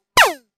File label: trampoline, platformer